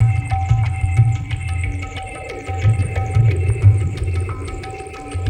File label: Ambi Groove